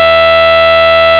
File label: saw; sines